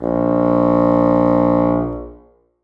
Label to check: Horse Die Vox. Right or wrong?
Wrong